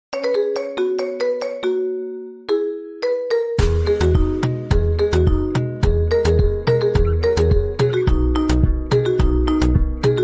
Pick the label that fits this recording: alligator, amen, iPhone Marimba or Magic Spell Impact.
iPhone Marimba